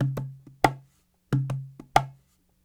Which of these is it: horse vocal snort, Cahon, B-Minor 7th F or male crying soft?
Cahon